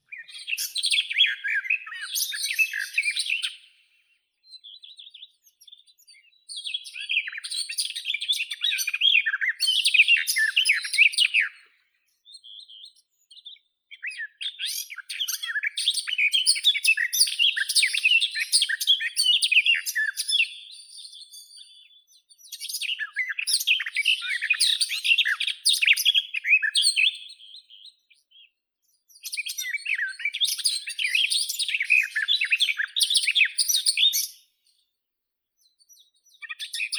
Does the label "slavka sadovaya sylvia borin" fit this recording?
Yes